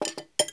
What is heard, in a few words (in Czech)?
nádobí; Mafie 1